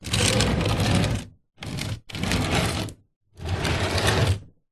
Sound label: household plastic esky slide slow short on slightly gritty concrete patio; zapsplat